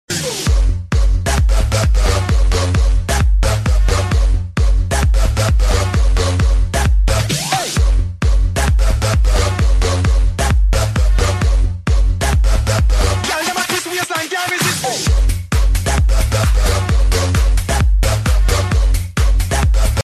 bap bap ringtone

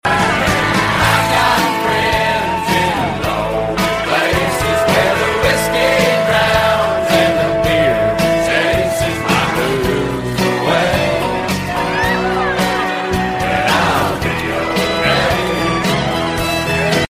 english songs tone ringtone

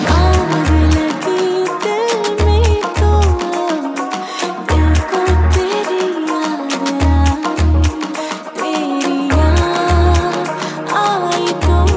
remix song ringtone

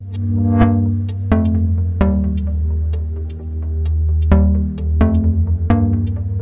musical sms notification tone ringtone; nokia

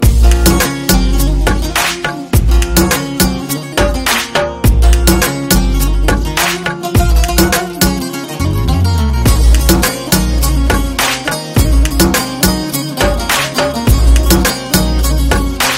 hindi music ringtone, bollywood

